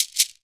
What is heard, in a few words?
PRC SHAKER, Afro Shakers; Roland LCDP14 Africa VOL-2